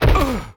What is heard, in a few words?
Bodyfall Hit With Male Grunt; SFX Kit; Bodyfalls